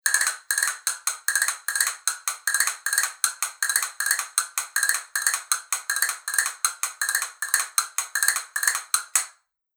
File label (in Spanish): fibra negra, castañuelas